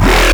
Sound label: Growl; osu